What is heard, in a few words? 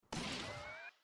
Shooting; Piper